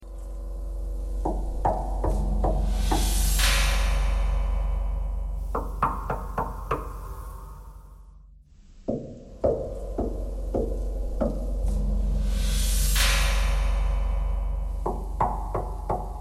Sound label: knocking thread noise